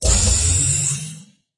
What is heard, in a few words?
lightning tube fill